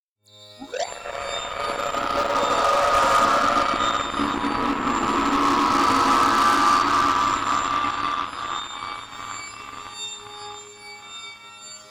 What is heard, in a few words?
Vigor Whirring